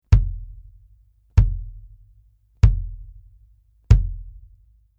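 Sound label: BASS Bury Beater, bass drum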